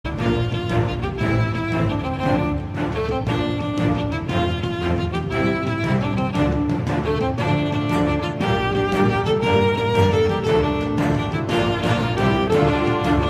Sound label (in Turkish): keman zil sesi; cep telefonuna keman sesleri